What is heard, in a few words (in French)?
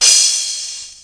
poc; Mobiclic; Jeunoel